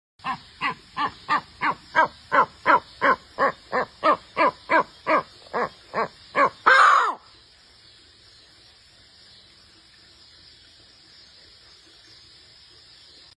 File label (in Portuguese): Canto da aguia sem rabo